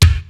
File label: GROOVE KIT, ENSONIQ TS-10; Rubber Chicken Software Sample Bank